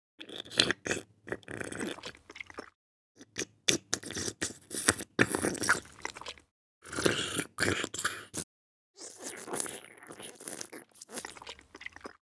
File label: Cartoon slurp drinking soup sucking noise; cartoon eating